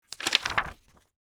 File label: ui book page fwd